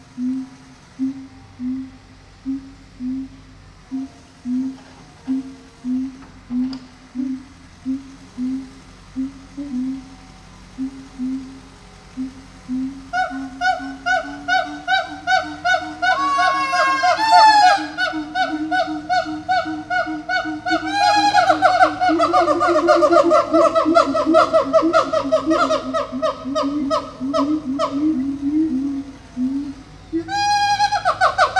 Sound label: Hylobates syndactylus duet